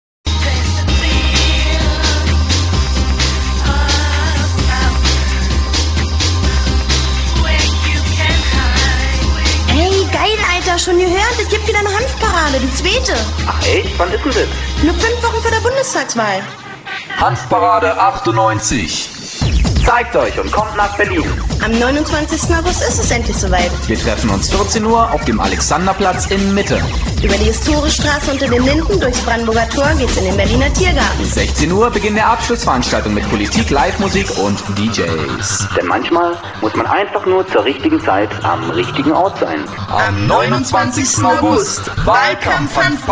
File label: jingle; hanfparade hp98